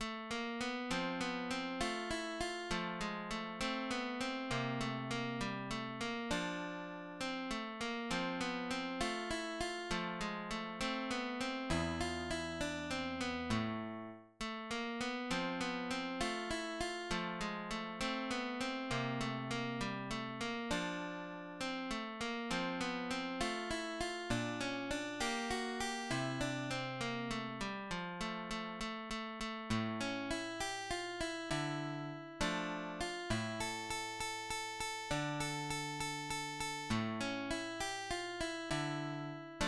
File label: Recreation in F, guitar